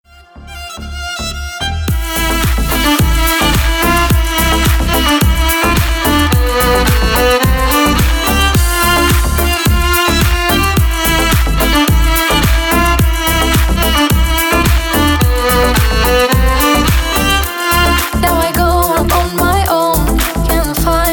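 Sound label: eastern melody, violin girls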